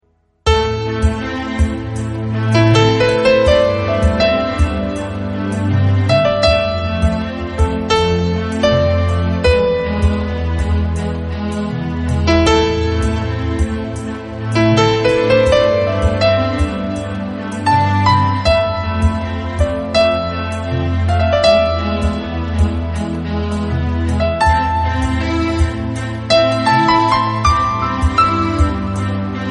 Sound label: Piano Step